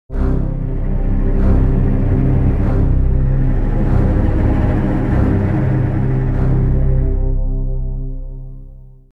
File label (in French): Catastrophe link; Jingles medley